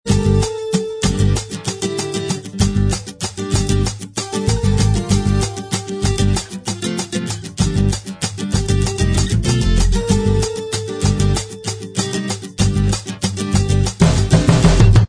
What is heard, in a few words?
Inst Track